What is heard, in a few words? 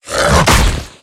zombi attack